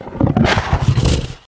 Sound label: Chimaera yes attack